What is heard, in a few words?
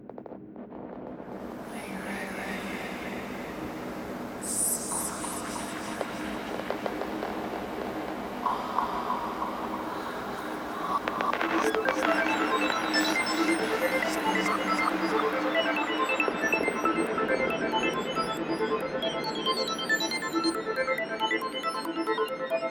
acid 138bpm